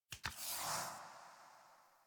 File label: UI Collectible Tarot Card Slide In; Warframe